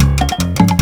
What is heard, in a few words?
STRANGE LOOP, BREAKBEATS; Crypton - DrumBase5000; BREAKBEATS#2